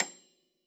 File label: Honky Tonk, piano; Classical Prepared Pianos; Total Piano; Honky Tonk mp